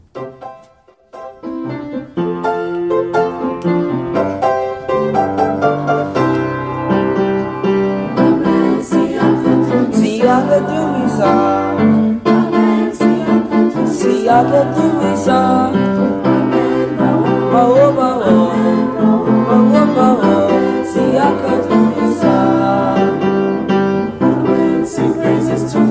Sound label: KIDS CHOIR; Amen Siakudumisa